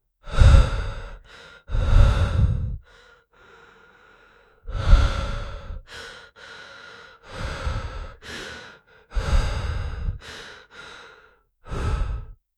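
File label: heavy breathing of survivor; survival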